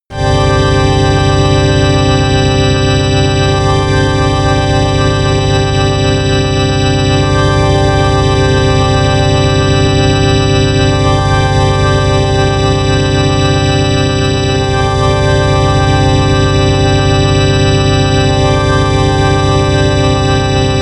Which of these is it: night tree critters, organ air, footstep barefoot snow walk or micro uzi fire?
organ air